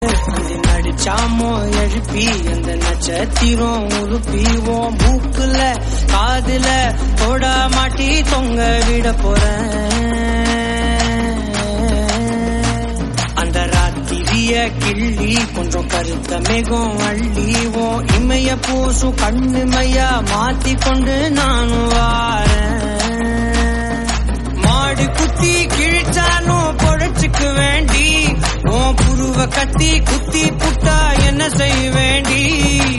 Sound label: Tamil Song; Viruman